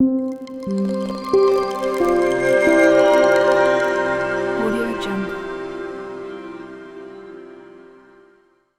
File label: podcast piano transition